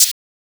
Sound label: Hi-Hats F